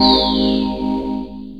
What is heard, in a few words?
RHODES; E-Lab; X Static Goldmine 2; akai